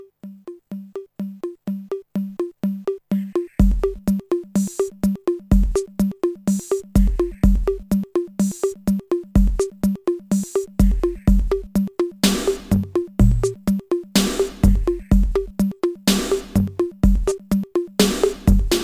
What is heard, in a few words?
percussion & choir